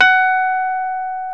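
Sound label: piano Rhodes Mk.V; Roland LCDP10 Keys of the 60s and 70s 2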